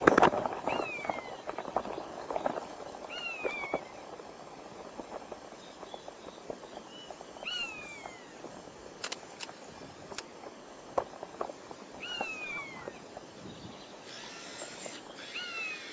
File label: flight; allen